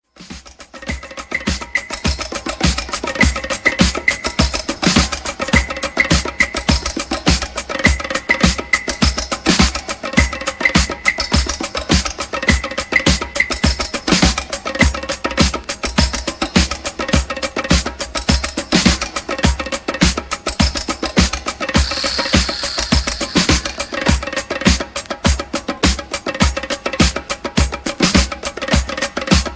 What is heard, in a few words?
Drums